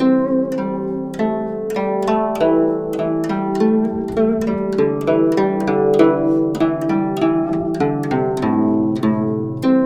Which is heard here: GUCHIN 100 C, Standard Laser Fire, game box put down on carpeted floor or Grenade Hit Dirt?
GUCHIN 100 C